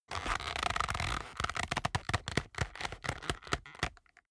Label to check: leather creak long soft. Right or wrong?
Right